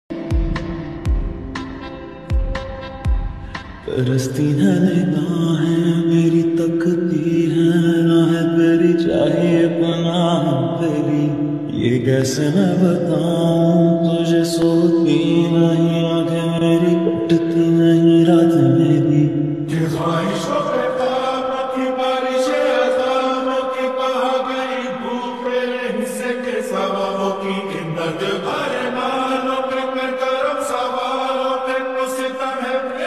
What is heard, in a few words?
slowed reverb version